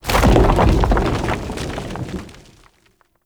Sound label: Rock Rumble; Warframe; Tau; Ambience